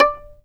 fiddle pizz D5; Sampleheads; Whole Lotta Country 1 & 2

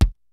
KICK SNARE; Kid Nepro; Elite; KICKS SNARES

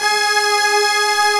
LINEAR SYNTH

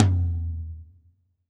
LW TOM, HR-16 DRUMS; Drum Mashines; EdgeSounds

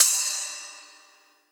Crashes; neuro; Optiv & BTK; Drums - One Shots

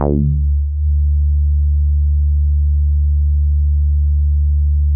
Synths BASS

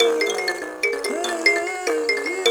PLK Buzz Kalimba Phr; Roland LCDP11 Africa VOL-1

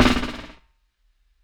SNARE BUZZ; DRUM N BASS:JUNGLE BEATS; DRUM N BASS KIT 2

